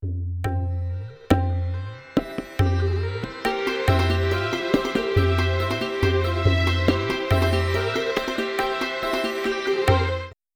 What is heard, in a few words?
Sitar Reveille ring